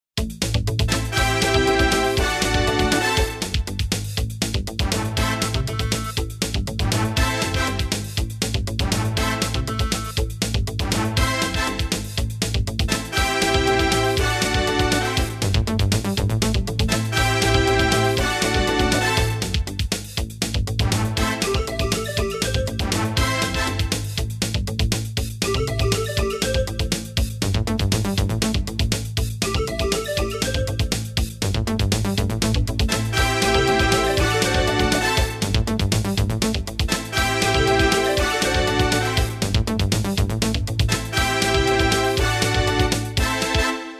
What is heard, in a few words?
Jingles; 90 DDD Jingles